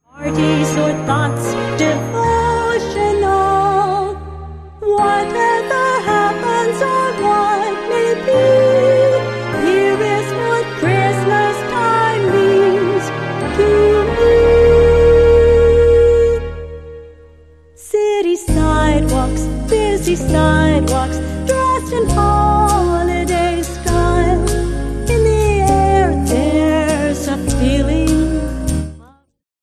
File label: jazz and jingles